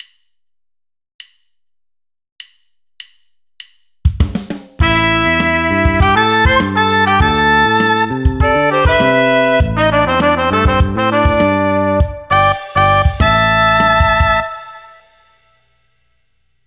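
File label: Horn Section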